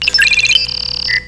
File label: computer beep